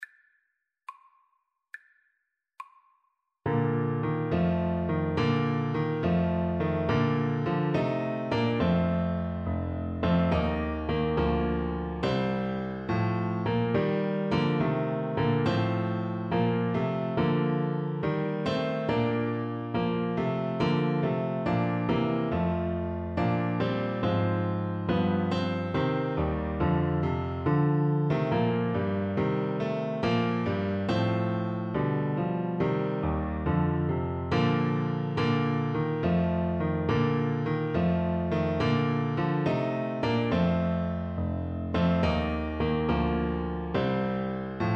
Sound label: wassailing, Trumpet